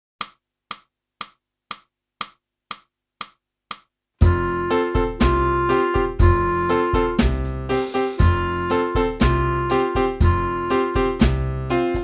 clarinet; boosey method

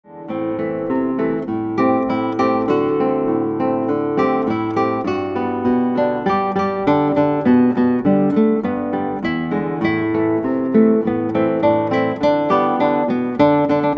Guitar

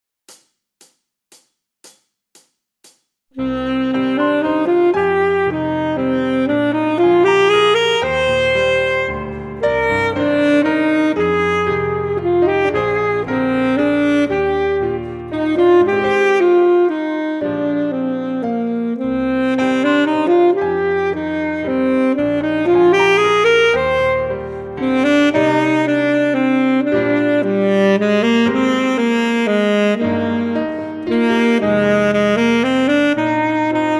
Full Sax

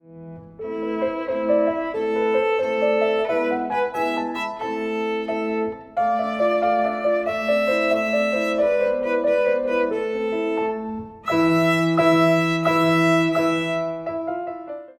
VIOLIN

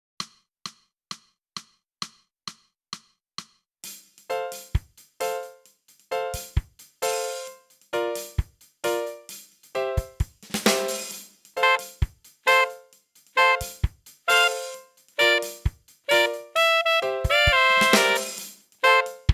sax, crayfish

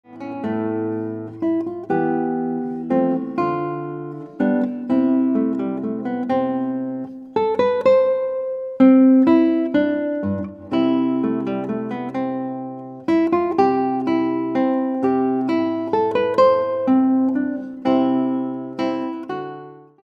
Guitar

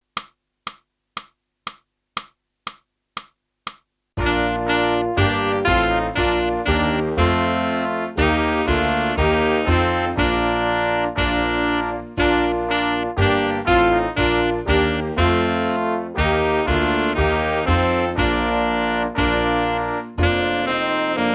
sax

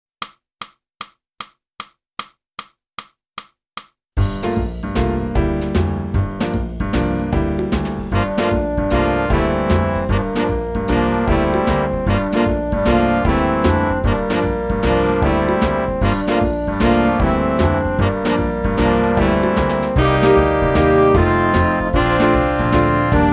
flute; boosey

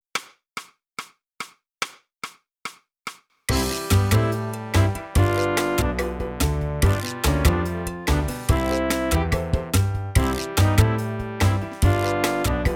flute calypso; Boosey Woodwind Method: Flute (Book 2)

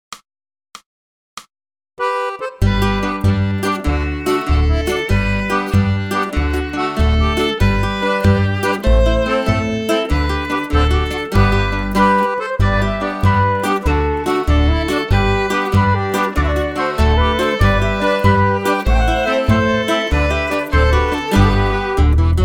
backing track

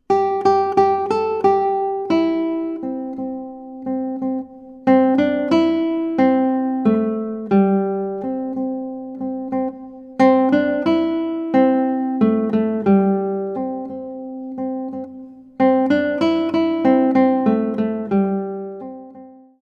Guitar

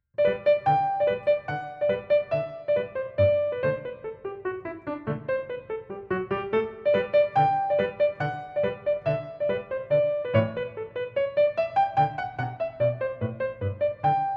piano

